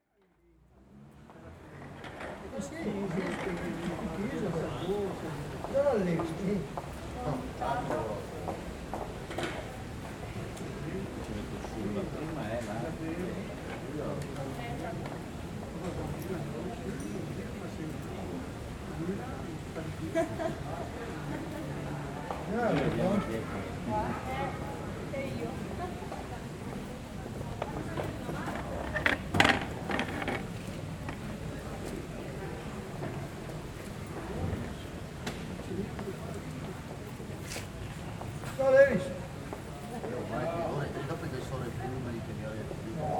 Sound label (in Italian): Piazza Delle Corporazioni